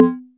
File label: Ana Hi, Electric Tom, Yamaha DTXpress